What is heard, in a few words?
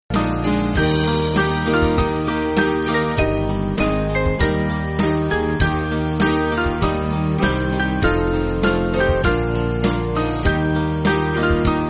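Band, Music Backing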